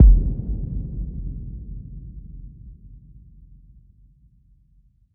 Impact

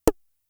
Tom, Toms; Fricke Schlagzwerg; Drum Machines

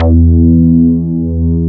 BS, Synth Bass, Analog Bass; Roland L-CD701; Synth Bass 2

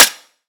claps, dance drum kit

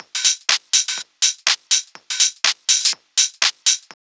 house loop

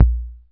dr kick; E-MU Formula 4000 Series Vol. 3; Analog Odyssey; VK+ Instruments

